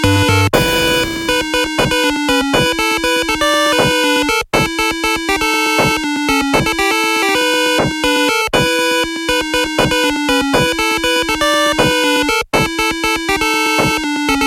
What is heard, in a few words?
8Bits